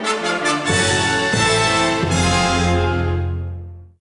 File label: Level Over Die